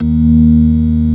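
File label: ORG.3 D sharp 2; Best Service; Advanced Media Trax Modern Composer; B3 FULL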